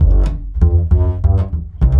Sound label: upright funk bass